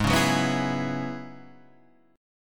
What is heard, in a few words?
F sharp 7th Suspended 2nd Suspended 4th, G sharp